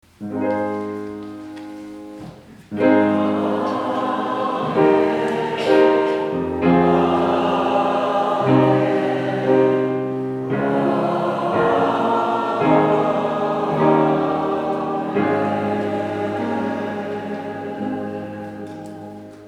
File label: Amen